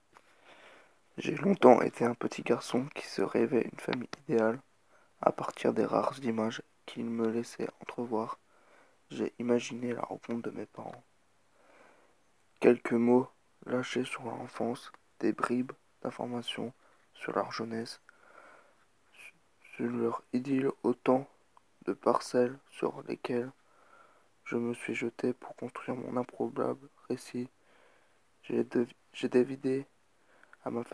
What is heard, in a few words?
Vocal